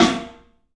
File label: PA snare; E-MU Producer Series Vol. 5 – 3-D Audio Collection; 3D Percussives